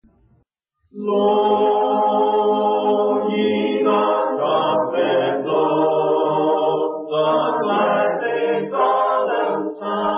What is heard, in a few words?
a capella hymn; blow ye the trumpet blow